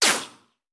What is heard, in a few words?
Bullet Ricochet; Sound Ideas; Guns